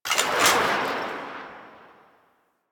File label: Merchant UI Open; Warframe; Veilbreaker